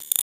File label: Glitch